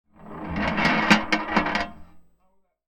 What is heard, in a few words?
Tin Tray, Marbles, contact mic; musicradar; contact mic madness; Raw